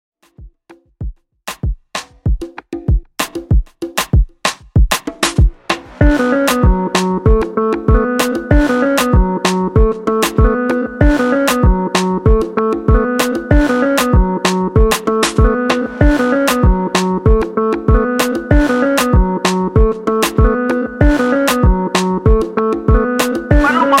Afrobeat 96Bpm

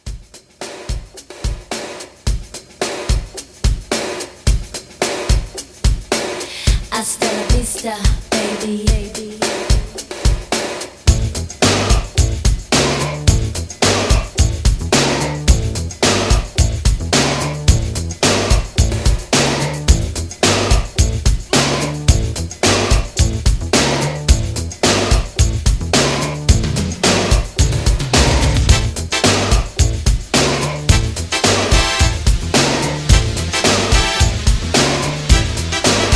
80s RNB Re Drum 109 CLEAN

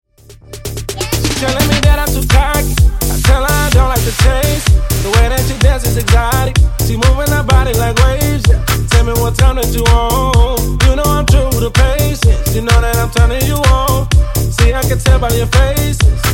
Funk Bootleg 127Bpm; Clean Dirty

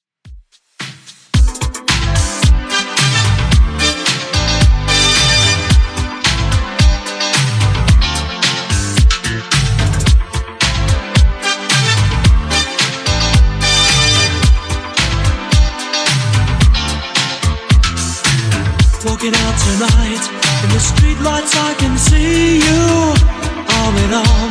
80s Redrum 110bpm

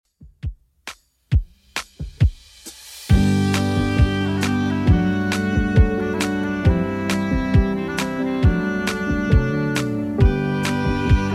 70s Redrum 135bpm CLEAN

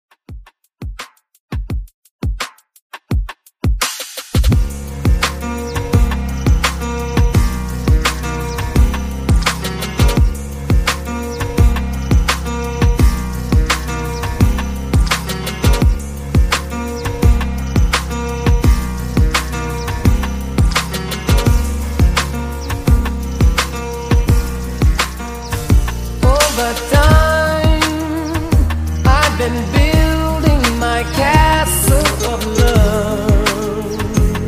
redrum C min 85bpm

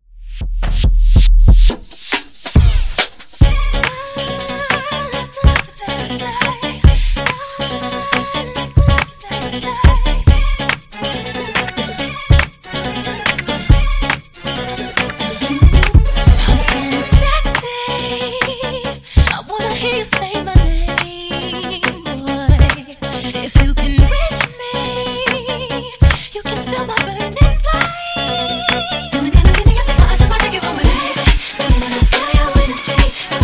Dirty 140Bpm; Dj Intro Outro Edit

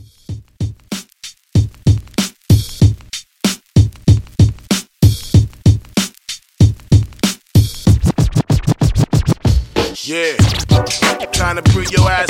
90s Party Redrum 95Bpm

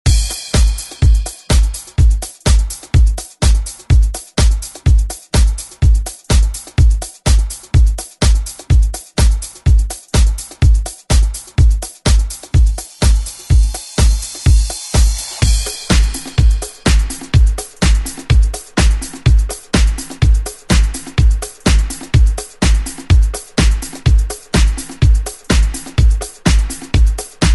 80s Instrumental ReDrum; DJ Mhark

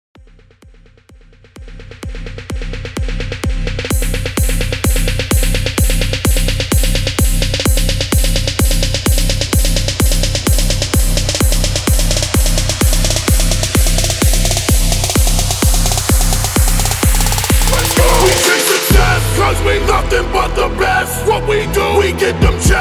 128 BPM

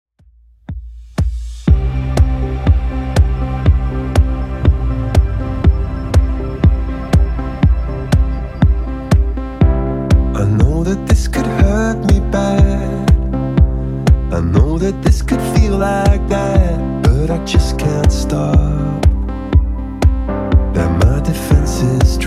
Top40 Redrum 121bpm

